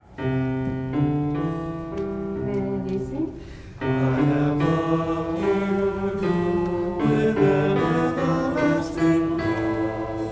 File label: bass and tenor; Gather Hymnal